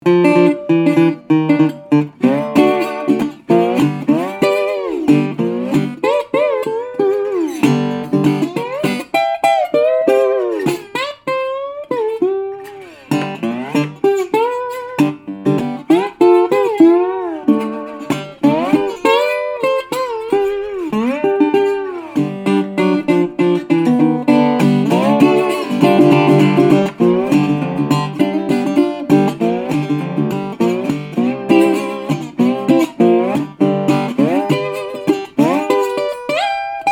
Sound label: National Resophonic Reso Rocket, steel-string 12-fret guitar